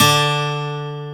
12STR, AC.GTRS

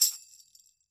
TAMB, TAMBOURINES; EdgeSounds; Drum Mashines VOL-1

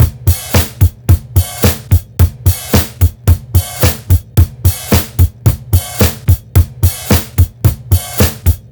beat, Roots Funk; USB Soundscan vol.41; AKAI

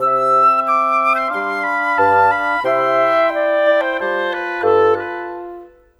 Cinematic, Orchestral Series; Sonic Foundry (Sony Creative Software); Orchestral Series VOL-3; Cinematic 24

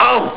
ough; Amiga Soundtracker sample

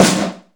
heavy s, Yamaha RX-5

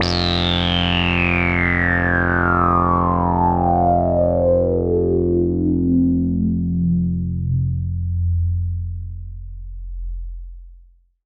bass F1, EMS VCS3

